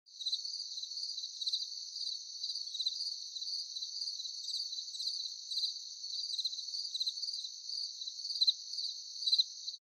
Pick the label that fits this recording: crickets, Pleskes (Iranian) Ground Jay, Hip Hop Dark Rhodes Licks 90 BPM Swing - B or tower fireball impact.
crickets